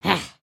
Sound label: Run; creatures organic; Lego; Strombie